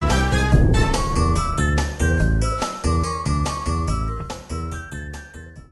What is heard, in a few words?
title music; crash 2